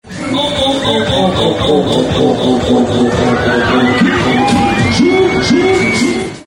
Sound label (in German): Jingels